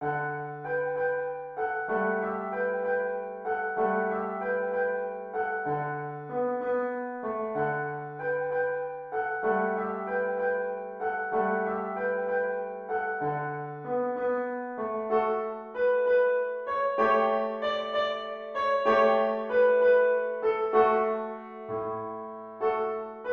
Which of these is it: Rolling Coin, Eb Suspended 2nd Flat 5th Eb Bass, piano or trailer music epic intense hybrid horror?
piano